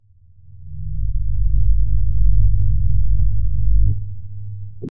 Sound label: futuristic ui blueprint, digital hum